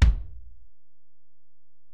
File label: Ac BD, Alesis DM-PRO; 300 Drum Machines